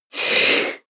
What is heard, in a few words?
female breath medium in